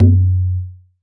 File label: Dhol; Roland BK-5; Percussion; Dholak